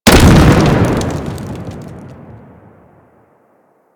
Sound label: Pillar Explosion; World On Fire; Warframe; Ember